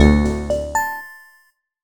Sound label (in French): ingame jingle objet; level 22